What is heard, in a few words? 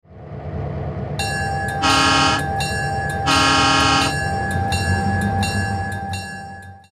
Nathan M3; Nathan M3 ULT